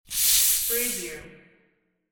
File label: soda fizz